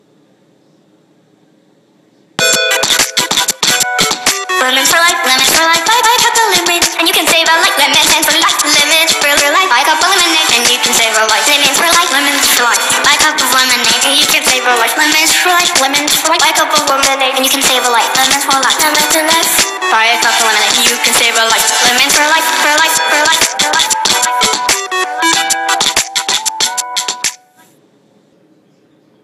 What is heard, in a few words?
rap